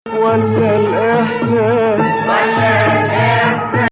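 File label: Nawa Hijazkar, Arabic music; Maqam Nawa Athar; Hijazkar 5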